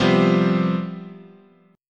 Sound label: C Major 7th Suspended 4th, guitar chord